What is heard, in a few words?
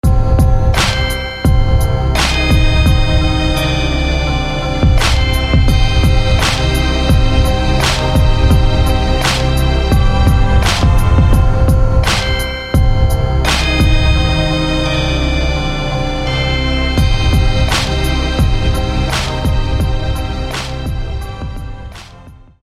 Night Strings; SFB FX